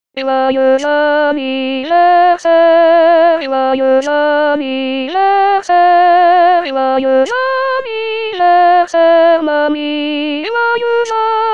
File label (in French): Soprano